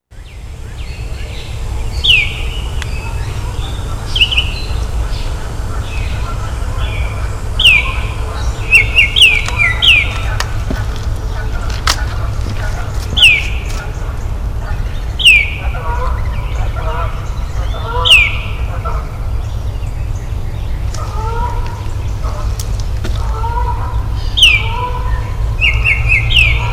Baltimore Oriole

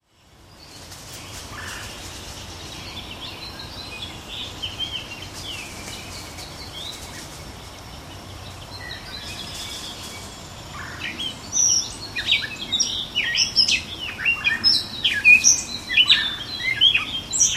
Catbird Red Belly

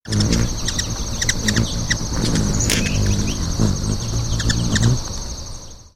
Hummer

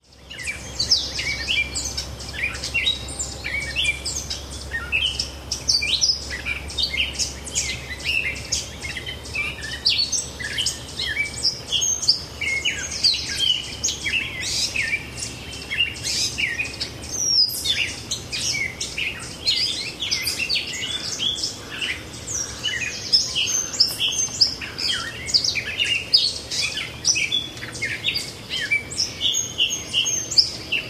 Catbird

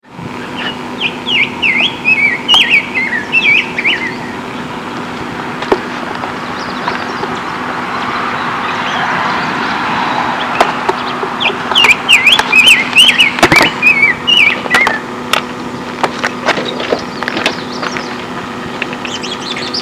Rose Breasted Grosbeak

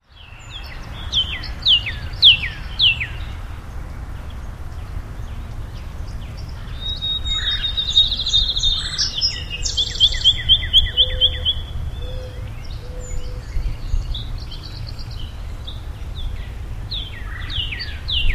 cardinal redbelly field sparrow dove

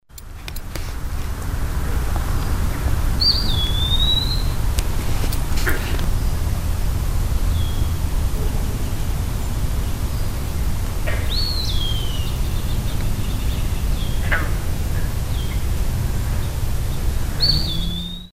peewee

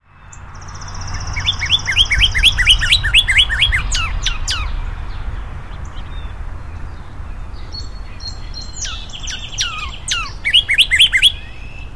mystery bird

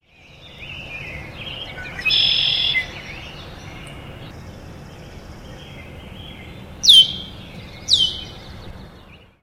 redwing

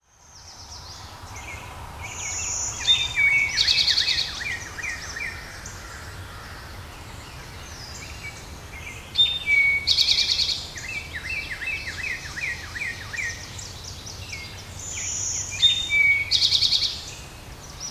Mystery Bird in Woods

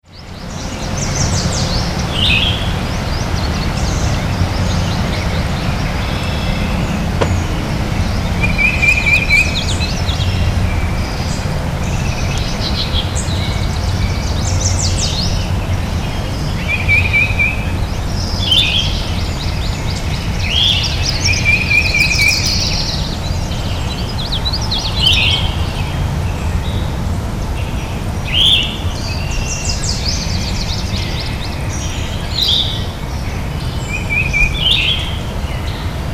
Phoebe & Titmouse; Phoebe(I think)

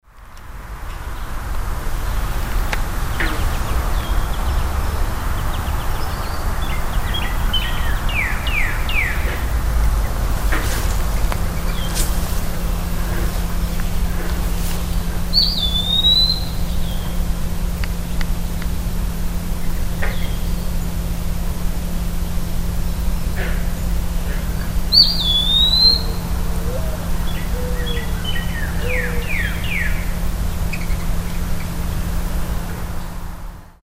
peewee

